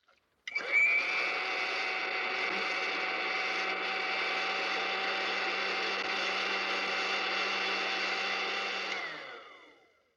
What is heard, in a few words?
blender